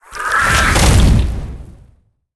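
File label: Mech Scorpion attack; monster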